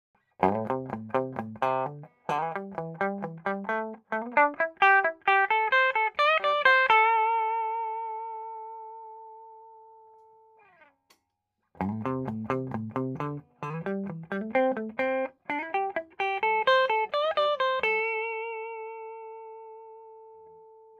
es335 cln

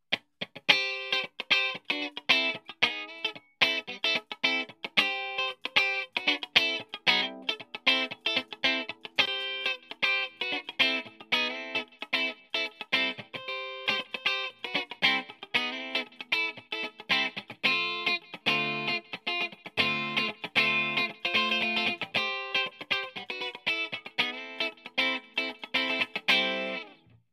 Line 6 DL4 clean

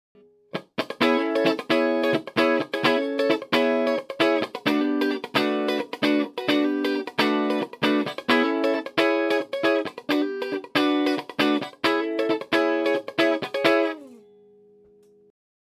vintage30 st cln

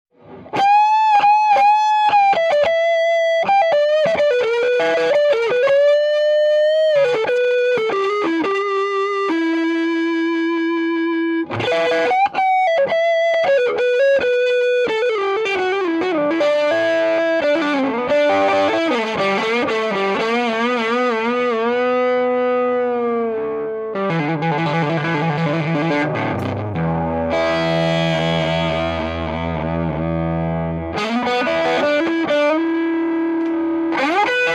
strato midup nck brg

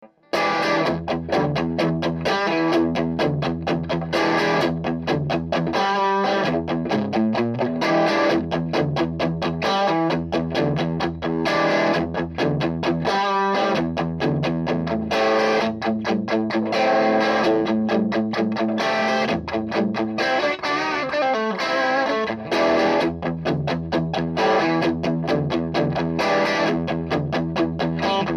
strato OD

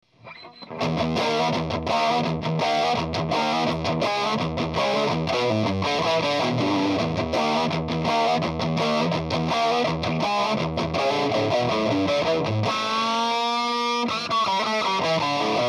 es335 dst vin

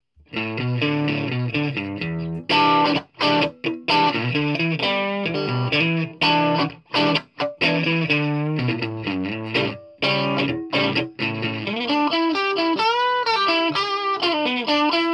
strat od

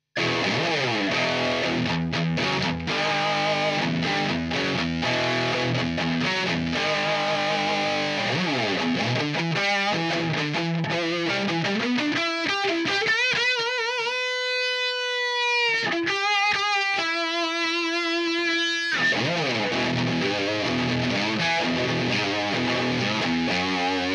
335 dst

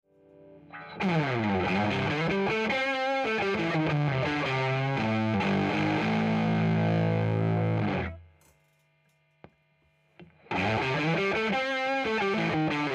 tube drive; tube driver

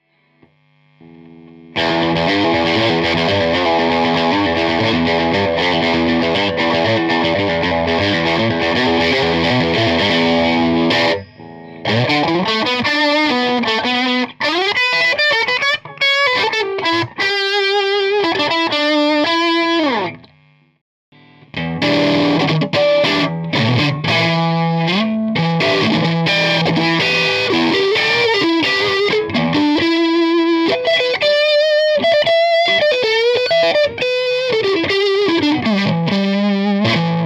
st fet

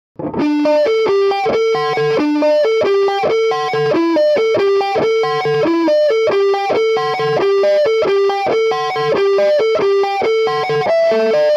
es335 mid boost

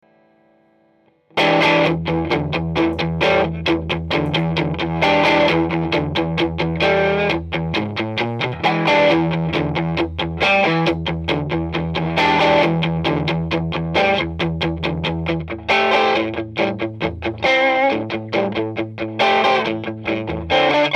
strato

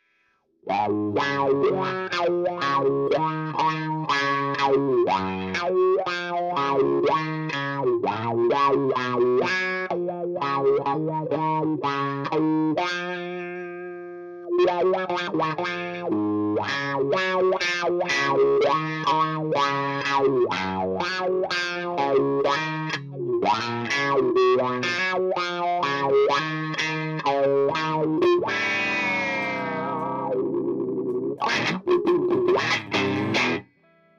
wah; DIY; 60s ods

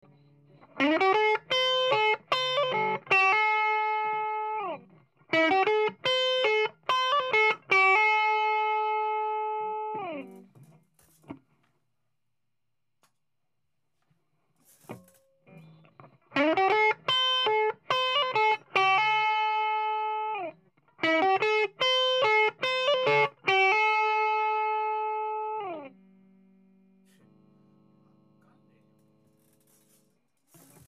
es335; DIY; 60s ods

